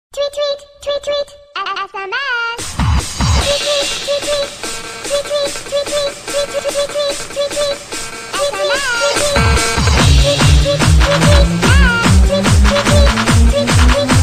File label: twit sms twit remix ringtone